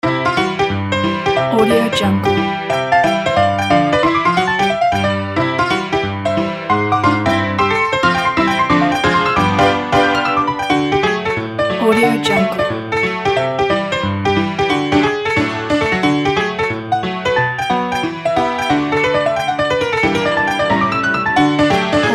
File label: ragtime classic